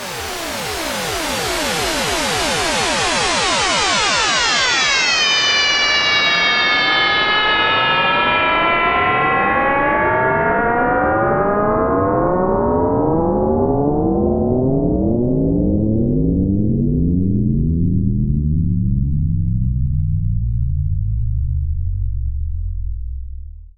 Downlifter

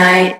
Vocal Oneshots; Vengeance Samples; Vocals

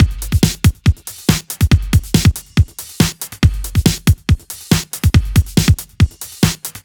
Breakbeat; Vengeance Samples; VEC2 Loops

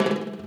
Bongo; Vengeance Samples; VEH1 Percussion

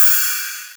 Cymbals Ride; Vengeance Samples

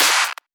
Claps

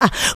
vocal house adlibs; PS-28 Vocal House Samples

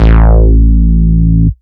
Bass Root A

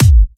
Bassdrums; Vengeance Samples

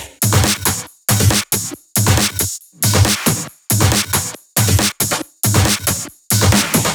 138BPM Kit; VFH1 138BPM The Epic Kit

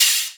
Open Hihats, Cymbals; Vengeance Samples

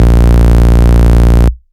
Root A#, Ultimate Bass; Vengeance Samples

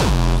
BD Distortion, Bassdrums; Vengeance Samples

